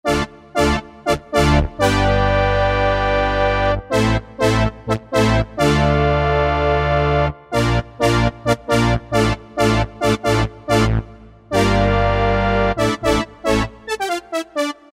JP. BRASS, Guitar And Keyboards

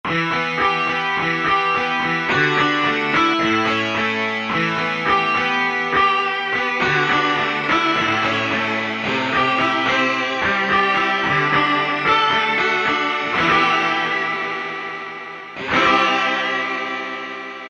DIST LEAD, Kurzweil K2000; Kurzweil K2000 Contemporary ROM; Contemporary ROM Programs and Demos diskette

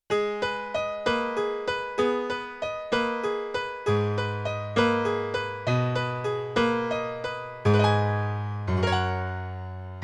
Filtered Piano; Yamaha TX16W; Jazz Performance; Jazz Performance 2

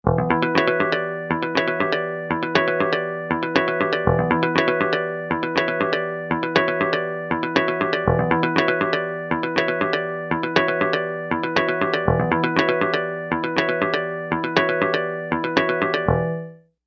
Arpeggio

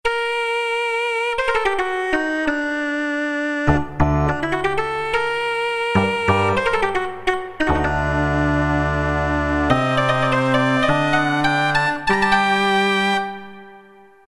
Oboe, Roland MT-32

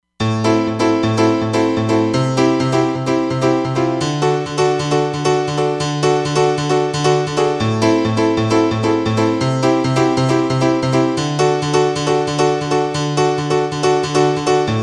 JD-800 Piano, Roland Fantom XR

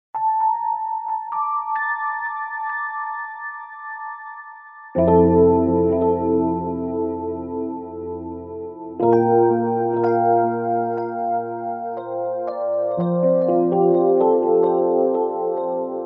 FENDER LO; Universal Sound Bank; Soundscan 09 Old School Keyboards

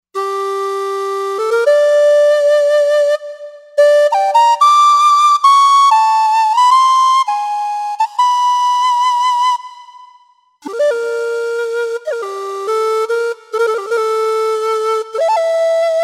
Bamboo, synth

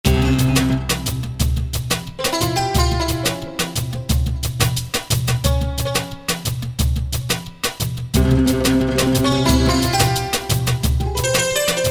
Mid Eastern Cafe

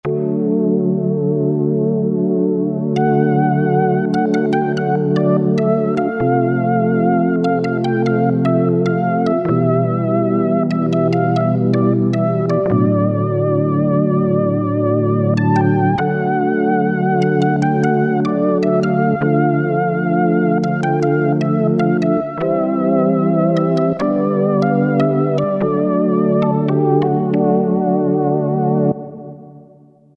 Organ, Cheetah MS6

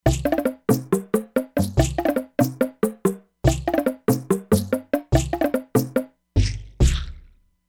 Log Shaker, E-mu Proteus-3; Factory patches

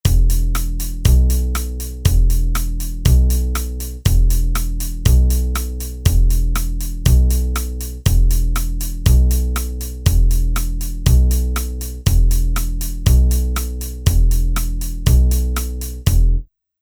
Country

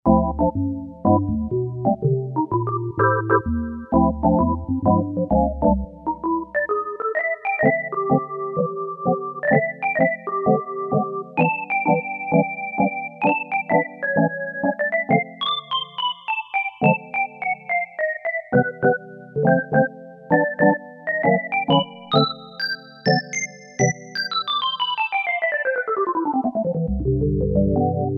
Organ 3, Casio CSM-1; Tones

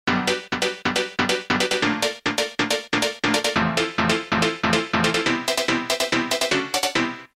hit x3; Roland M-DC1

